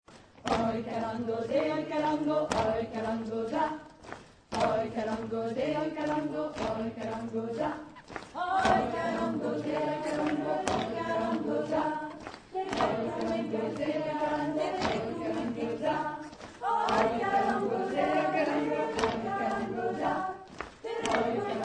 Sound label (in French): voix